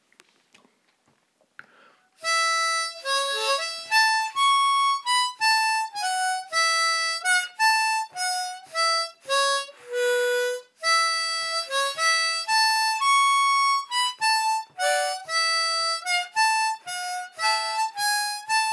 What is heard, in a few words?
Harp